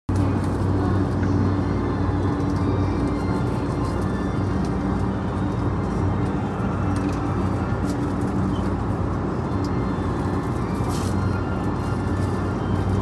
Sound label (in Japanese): JR総武線快速市川西